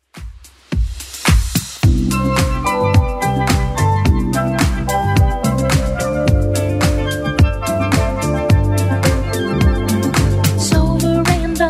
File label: 80s Club 108bpm